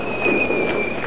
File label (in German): PFEIFE